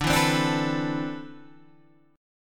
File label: D 13th Suspended 2nd D